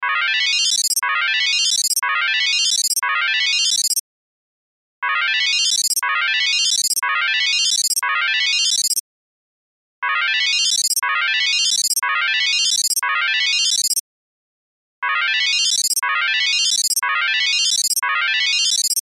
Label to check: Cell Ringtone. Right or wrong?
Right